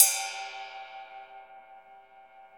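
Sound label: Cymbal Ride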